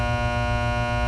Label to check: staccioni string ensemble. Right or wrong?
Wrong